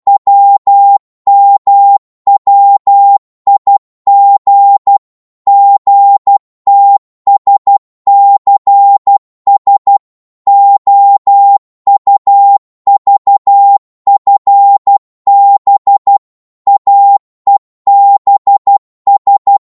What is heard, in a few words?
12wpm, Morse